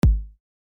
beat

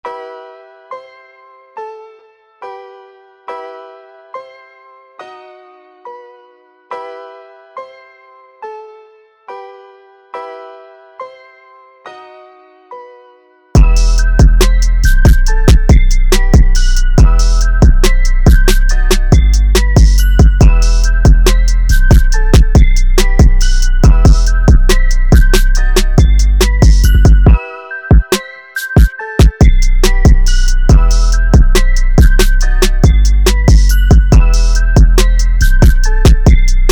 idk beat

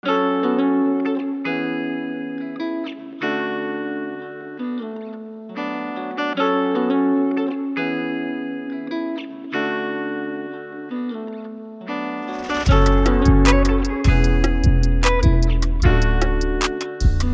guitar boye

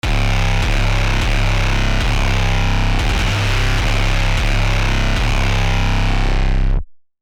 bass

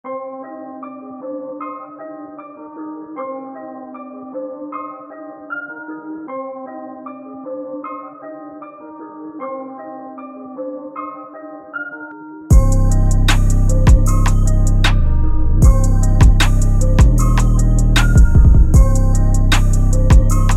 hard beat

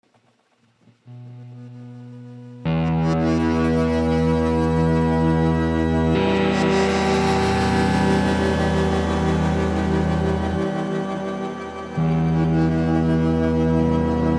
guitar

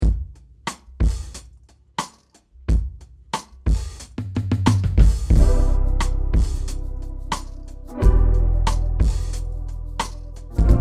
type beat 90

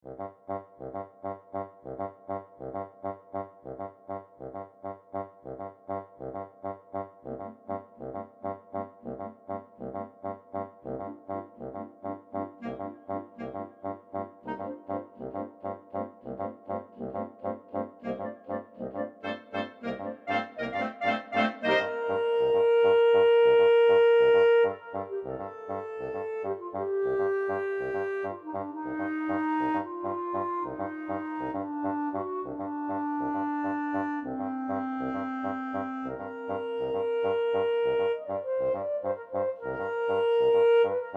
bat orchestra

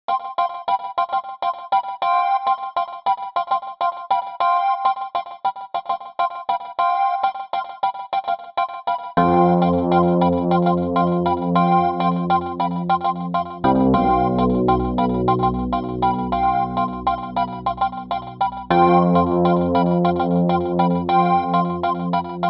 80s fun

